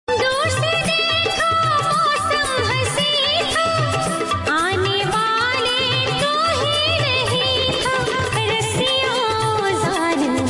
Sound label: Tone